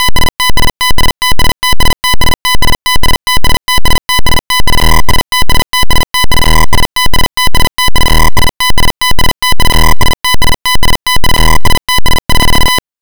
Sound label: brrring